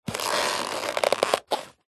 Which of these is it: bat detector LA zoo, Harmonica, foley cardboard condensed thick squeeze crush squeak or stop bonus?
foley cardboard condensed thick squeeze crush squeak